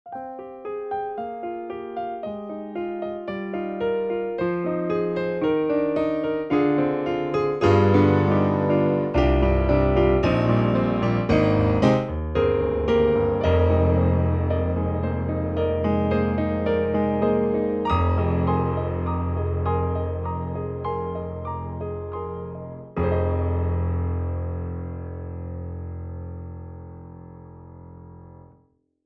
piano; stein-tg2

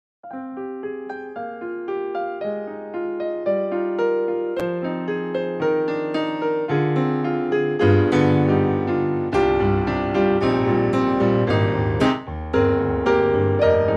piano, korg

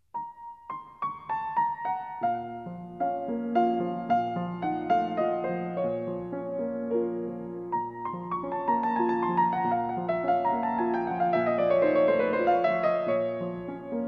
Chopin Nocturne No1 b moll

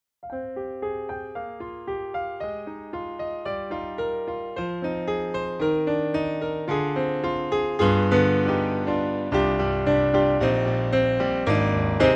steinway, piano

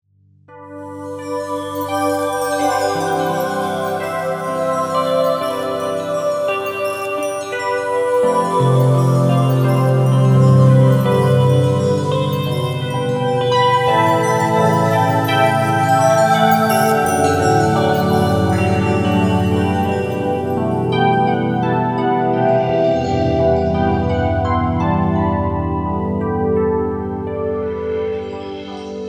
Rhodes

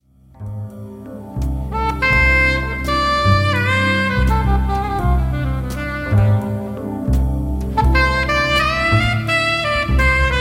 Song Alto; SAXZ vin1950 6

